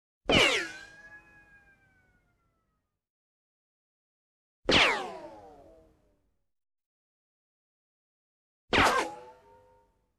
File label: bullet ricochet; کمانه گلوله